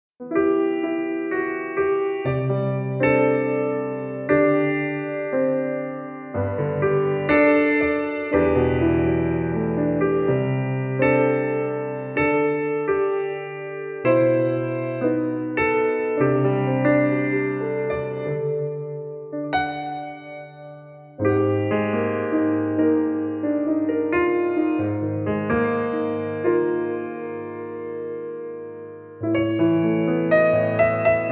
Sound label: Piano Version